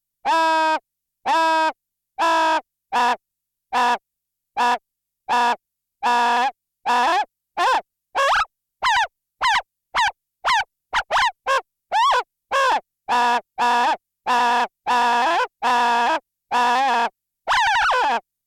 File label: MS-20; (les variations de ce son se contrôlent d'un seul doigt !); jurassik park; Démo audio des fiches didactiques de sons analogiques détaillées dans le livre; Sound design : Alain Cassagnau